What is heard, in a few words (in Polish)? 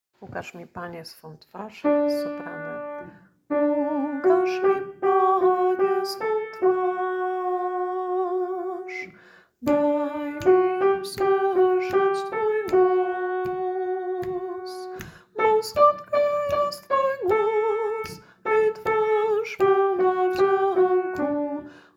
Sopran; Ukaz mi Panie swa twarz